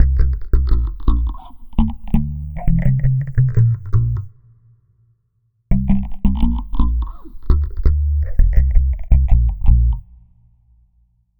Dub Bass, Electric Bass; Dub Bass Strategies; Sonic Foundry (Sony Creative Software); Leo Cavallo Bass Taster

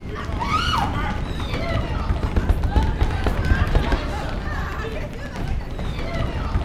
STAMPEDE, Gospel; Spectrasonics; Vocal Planet

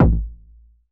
kick, Roland MC-909

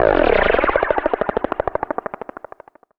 Dance Floor Bass, Multisample Basses

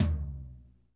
TOM, Real Toms, Dry Toms; Roland L-CD701

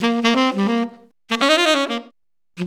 SAX, ACID JAZZ; Best Service; Advanced Media Trax Modern Composer

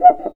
CUICA; ILIO

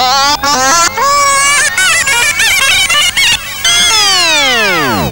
backscratch, scratches; Zero-G; Freak Beats

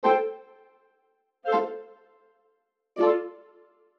orch sting; musicradar; cartoon caper

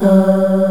OOO C3; East West; Global Sounds

